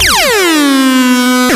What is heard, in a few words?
Acid Sounds H, Synths; Vengeance Samples